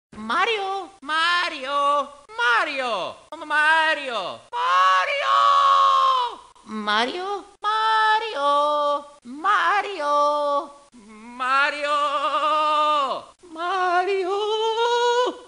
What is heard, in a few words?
screaming; Luigi